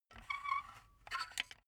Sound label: silicone gun empty pump slow; soundpool